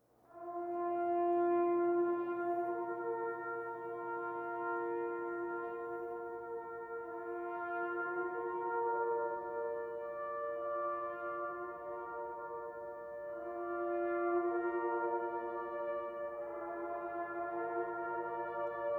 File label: Echo Taps; choir; All SAINTS choir